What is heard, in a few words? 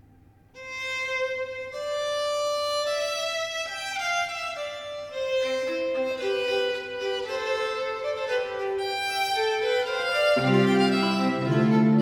Sonate 2 in D major - 2. Allegro; Arcangelo Corelli; Corelli - Complete Works; Klassika